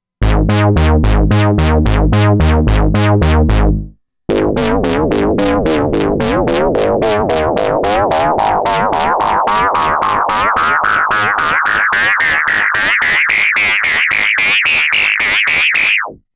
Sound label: Synth Bass; Korg Ring